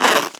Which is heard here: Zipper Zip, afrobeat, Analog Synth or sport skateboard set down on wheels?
Zipper Zip